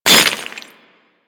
Melee Impact Cement; Warframe; Weapons

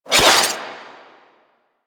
Rising Blades Whoosh E; Warframe; Warframes; Pax Duviricus

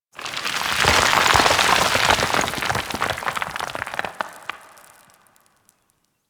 Coring Rock Pour A; Warframe; Ambience; Grineer; Grn Intermediate Coring

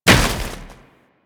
Moa Step Snow, footsteps; Enemies; Warframe

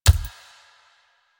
Steps; Warframe; Kalymos

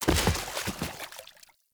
Battle Dog Bodyfall Water, Foley

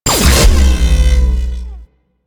Orange Mine Activate; Warframe; Venus; Venus Commander Orange; Abilities

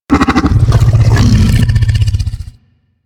Vocal Captured Idle; Warframe; Hunting Critters; Undazoa

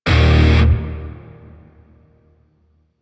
Shawzin Min Chord; Grineer Shawzin; Warframe

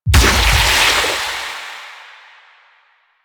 Health Leech Activate; Warframe; Eximus

